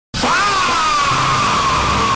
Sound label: FAHHHH